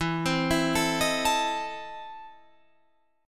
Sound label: E Major 7th Suspended 4th Sharp 5th, Guitar, Drop D